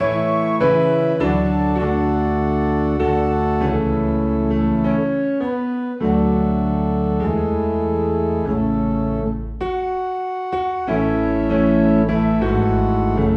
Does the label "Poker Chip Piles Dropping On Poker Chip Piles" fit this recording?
No